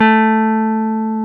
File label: GTR, Jazz Guitar; Club-50 - Foundations; Jaz-Kessel